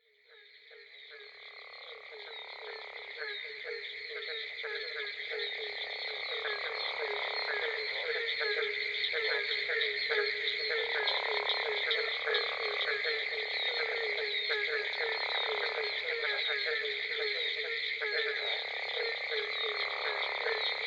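Southern Cricket Frog, Barking Treefrog, Gopher Frog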